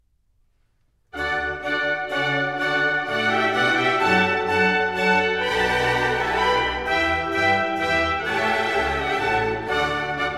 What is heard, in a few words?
Menuet; Water Music Suite No.2 in D major; Handel Masterworks; Klassika